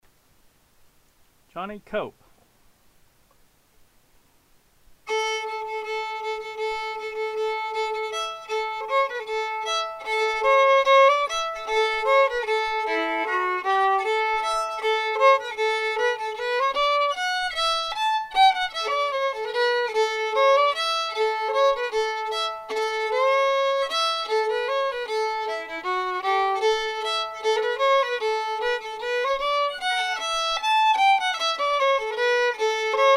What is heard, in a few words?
fiddle; Johnny Cope